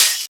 Open Hihats, Cymbals

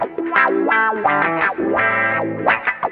GUITAR

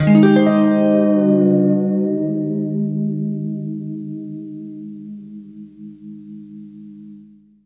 FLESH CHORDS, Guitar

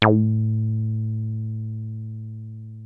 303 F#2, TB 303; Hollywood Edge; Giorgio Moroder Rare Synthesizer Collection

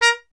trumpet; Miroslav Vitous; Solo Instruments VOL-1

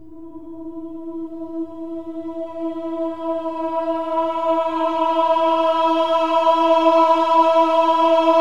OH-AH E4, choir; Spectrasonics; Symphony of Voices

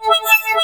DG WAVE, DIGI SYN; AKAI S6000 CD-ROM - Volume 1; SAMPLE WAVE MIXING 1

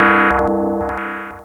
chord

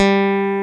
G2, GUITAR; AKAI S-Series CD-ROM Sound Library VOL-8

